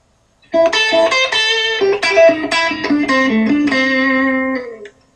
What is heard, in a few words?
blues scale lick, guitar